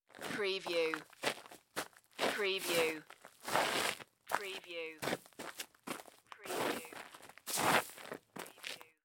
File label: Footstep Dirt Walk